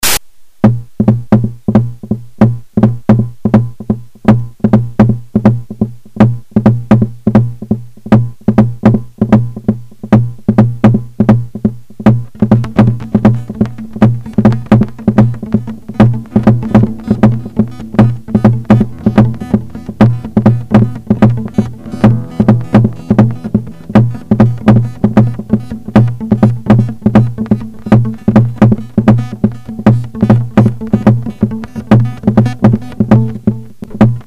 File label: asian alien